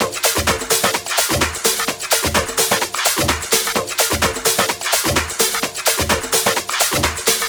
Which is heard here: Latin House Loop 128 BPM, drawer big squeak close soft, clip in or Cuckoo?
Latin House Loop 128 BPM